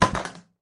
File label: Drop Plastic; Lethal Company